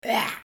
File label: monster death grunt; 2D Mega Pack Sounds